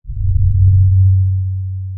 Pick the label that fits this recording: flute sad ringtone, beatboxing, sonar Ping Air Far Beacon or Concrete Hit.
sonar Ping Air Far Beacon